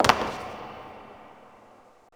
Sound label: succubus step; queen girl